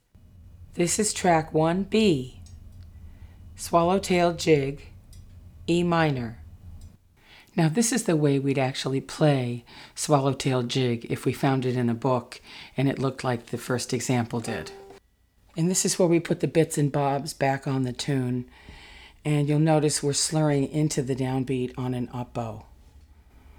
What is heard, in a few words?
Irish Swallowtail Jig slow